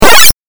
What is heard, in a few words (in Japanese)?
8bit 獲得